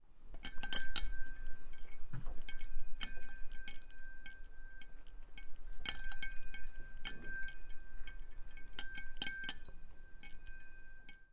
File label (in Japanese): tori